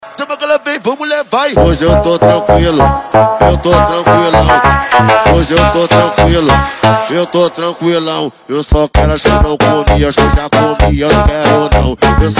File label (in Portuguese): esp de cavalgada; adales auto som; hb20 panther black